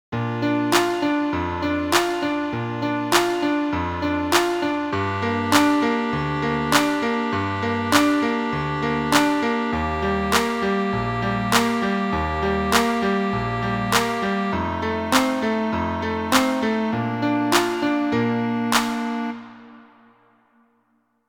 off the beat

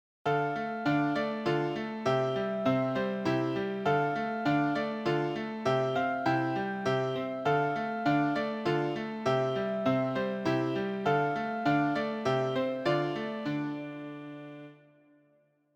simple time 4 4

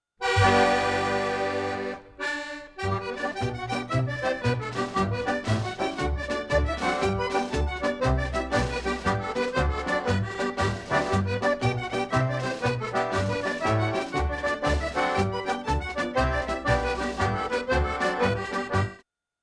jig

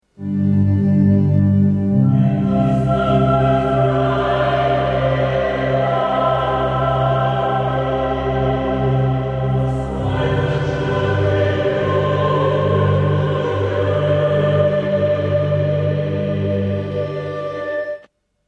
reverb

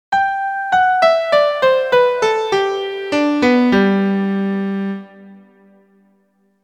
descending

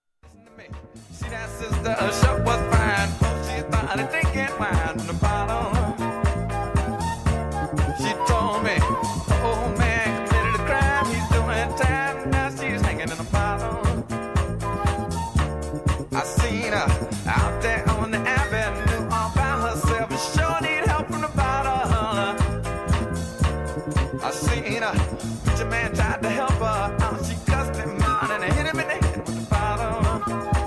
jazz funk

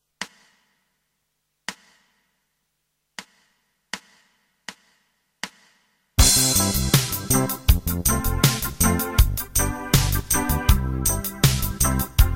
Reggae, Guitar backing

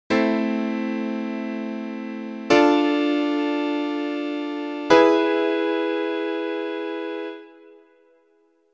chord prog 1 4 5

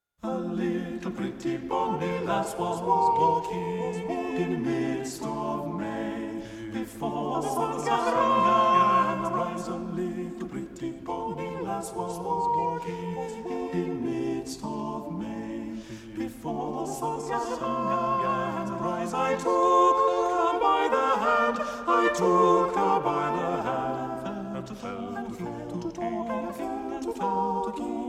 madrigal

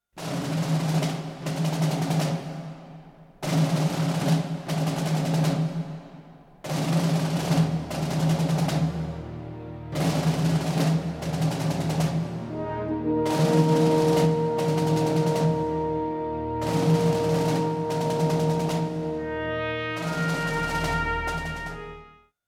ostinato

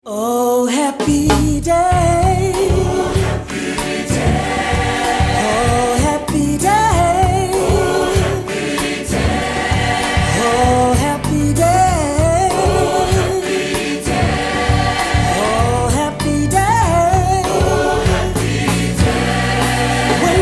gospel

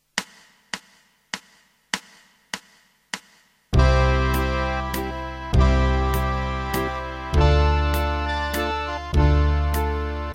Waltz, Guitar backing

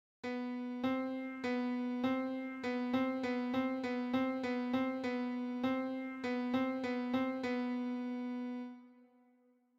semitone

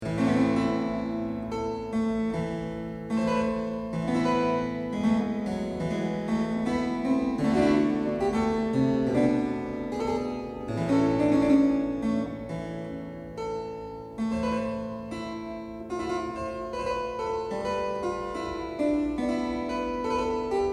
pavan

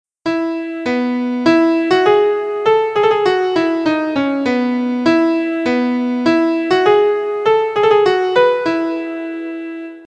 mordent

